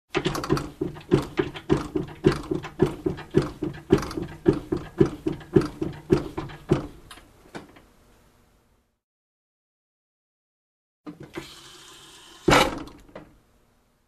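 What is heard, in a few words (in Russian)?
звук поднимания парикмахерского стула ножной помпой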